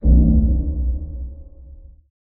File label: Hit Interior